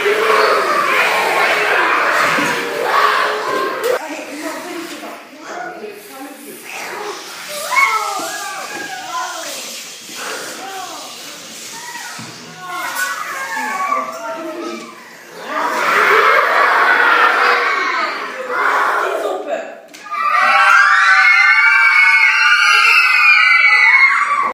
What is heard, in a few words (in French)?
cirque